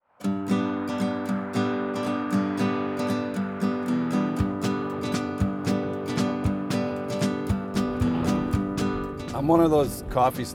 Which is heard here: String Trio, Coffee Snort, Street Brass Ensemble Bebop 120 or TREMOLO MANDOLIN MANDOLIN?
Coffee Snort